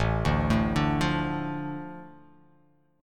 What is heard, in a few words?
A Suspended 4th Sharp 5th